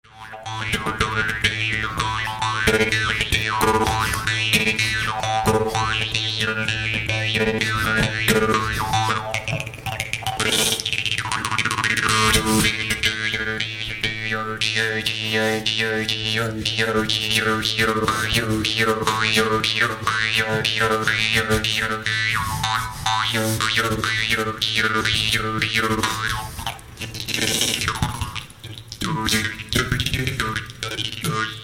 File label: Homus, jews harp